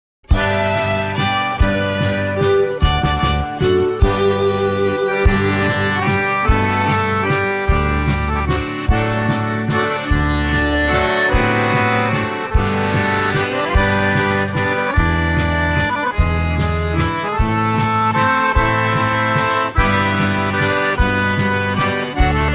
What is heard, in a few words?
veleta waltz instrumental